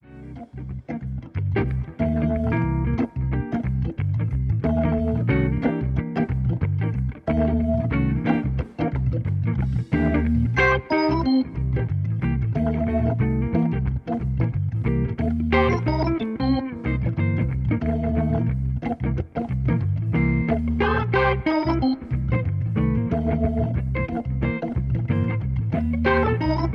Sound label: w o drums